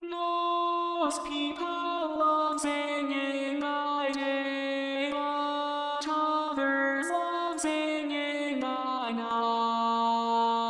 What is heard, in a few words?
Tenor, barbershop tags